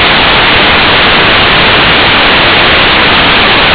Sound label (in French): bruit